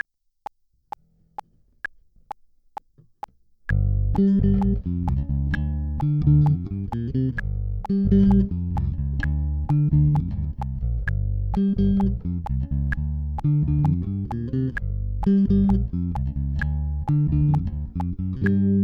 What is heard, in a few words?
fading away bass harmony